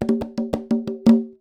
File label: Fill, Conga; percussion; musicradar